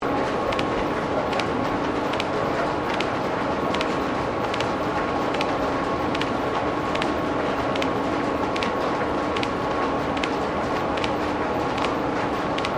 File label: Wheelwright Belt Drive Machinery Power Belt Operating Close Loop, Colonial era machinery